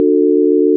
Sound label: Chord